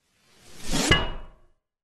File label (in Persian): برخورد تیر به زره